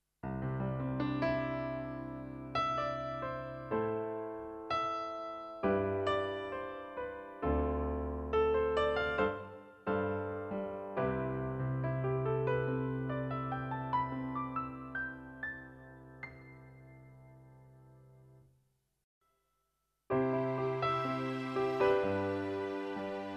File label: Piano